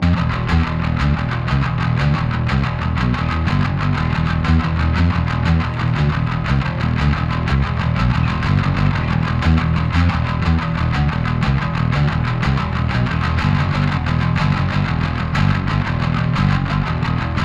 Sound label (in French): basse